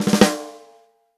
rock snare chromatic, real drums; Best Service ProSamples vol.43 - Real Drum Kits

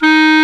CL D#3, CLARINET; Best Service ProSamples vol.55 - Retro Sampler; AKAI

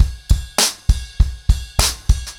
100BPM, ACOUSTIC DRUMS

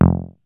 DISTORTION BASS

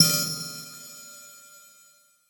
One Shot Ambient Hit, Drum N Bass - Percussion; Sonic Foundry (Sony Creative Software); Knutrix Drum & Bass

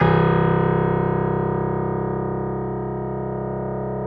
C0, PIANO; AKAI S-Series CD-ROM Sound Library VOL-8

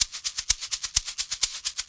Percussion Loops, Shaker & Tamb; Houseworx

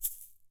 EGG, Percussion; Sampleheads; NYC Percussionworks; ST PERC VOL1

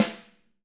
6X12 ALUMNUM, Living Drums

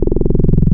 Filter FM, wavetables; Filter FMX; Animoog

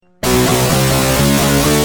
Guitar, Industrial

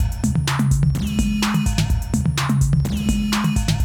ACID JAM

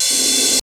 RVRS, Hip Hop R n B; USB Soundscan vol.19; AKAI

